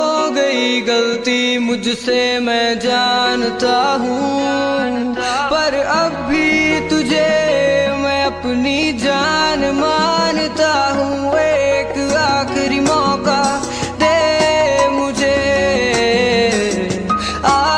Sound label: punjabi ringtone